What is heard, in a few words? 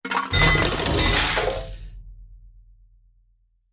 impacts metal various items junk drop clatter